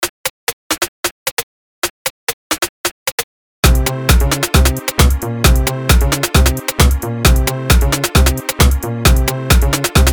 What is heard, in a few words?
crazy maschine gun techno